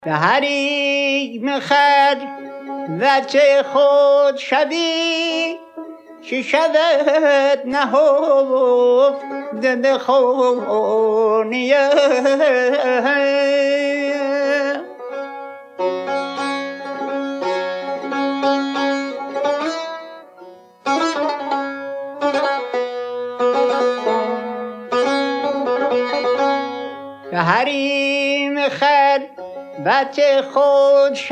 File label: Chaharpareh; ابوعطا یکی از آوازهای موسیقی ایرانی است و از ملحقات دستگاه شور دانسته میشود; آموزش دستگاههای آواز استاد دوامی